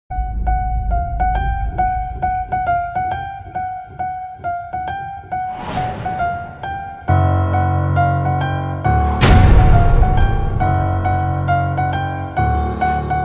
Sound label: teaser bgm telugu ringtones; Khiladi